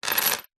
household jewelry necklace silver charms set down wood table; zapsplat